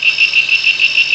NIGHT; CMI Sound Library Rev 1.3

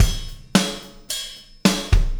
Beat, Loops

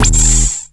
Soul Hit; M8

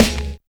HIP SNARE; Drums In Your FACE!

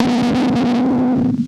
BURN-BY, SPACEY DRUMS; Spectrasonic Distorted Reality 1

